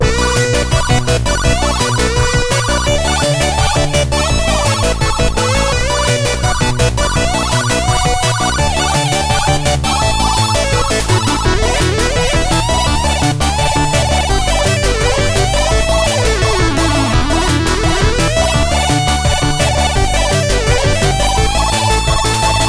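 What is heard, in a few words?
chipmunks, AdLib sound card; madness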